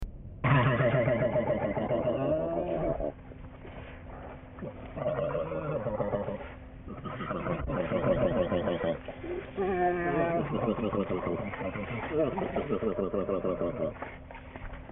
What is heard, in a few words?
gurgle, bear sounds